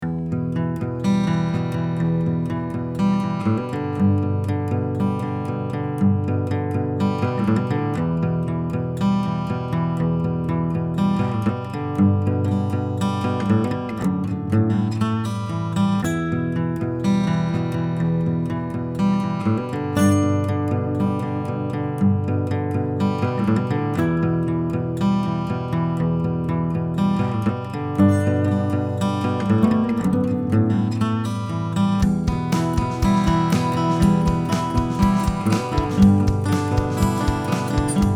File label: Civil War acoustic intro